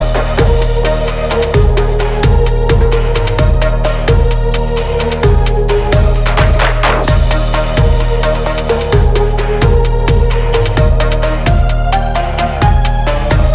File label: 808 Drop Mix Instrumental